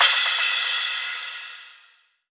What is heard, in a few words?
Crash, Drums Cymbal